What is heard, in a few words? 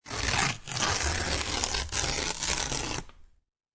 Paper Rip Slow, A very slow ripping of paper sound; Classroom; Sound Effects:Schools